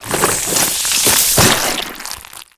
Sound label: juicing, antlion; Half Life Alyx